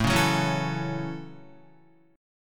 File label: A 6th, Guitar; 4,4,6,6,4,5; strummed